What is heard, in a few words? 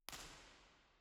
First IR Sala 2800 Dodec Center BIN, Binaural; Santa Cecilia Roma; Measurements 2005; Soundfield